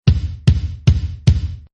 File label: four on floor, Button; Buttons; Multimedia